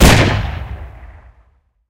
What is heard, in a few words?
breda safat 127 shot